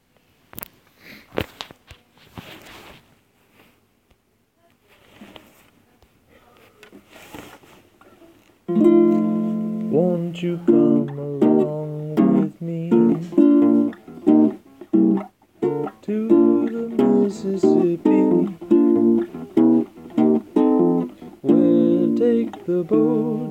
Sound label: Blues